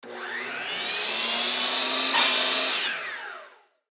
Chop Saw Rigid, Chop Saw Rigid 12 In Turn On Cut Wood Turn Off Fast; Saws; Industry; Blastwave